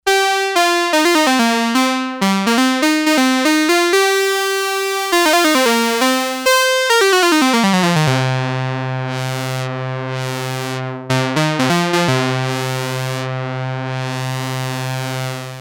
Analog Brass, Arturia MicroBrute; Factory patch sheets

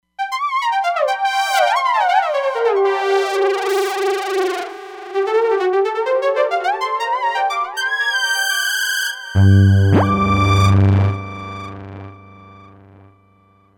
Mini Growl, Roland Fantom XR

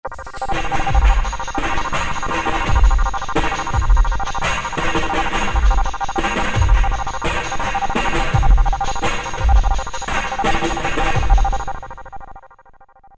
Under Ether, Roland SR-JV80-11 Techno Collection

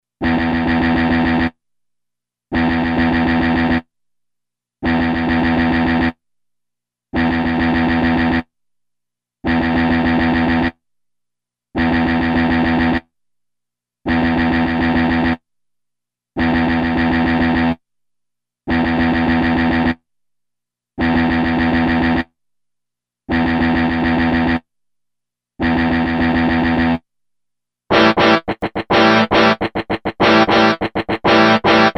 Elec Guitar song

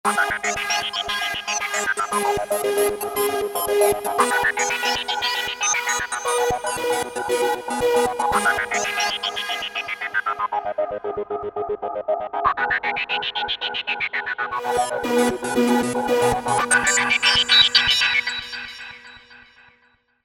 Syncronicity

